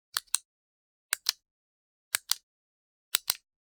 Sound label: Generic Button Plastic Tiny